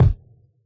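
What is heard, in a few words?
bass drum Jungle, Yamaha DJX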